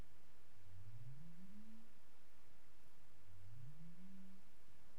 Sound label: north atlantic right whale